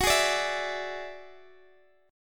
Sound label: Gb 7th Suspended 2nd Sharp 5th Gb, Guitar Standard; strummed